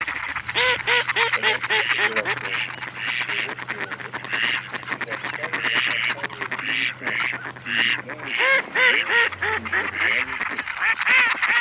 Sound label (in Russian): Кряква Germano; plurifon